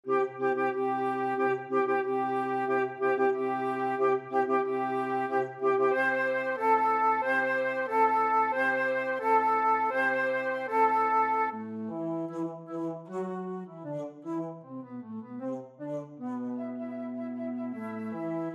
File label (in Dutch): fluit; Marche Royale de Lion; Carnaval des Animaux; Camille Saint-Saëns